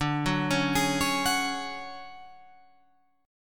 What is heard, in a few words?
D Major Sharp 11th D Guitar Open F, chord; DM#11 arpeggiated